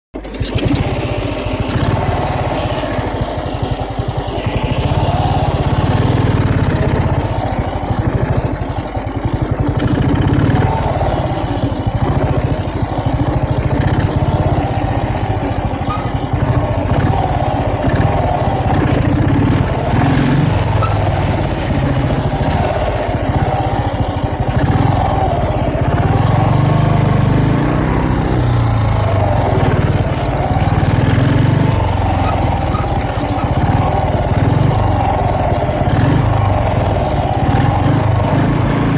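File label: Start Away Norton Motorcycle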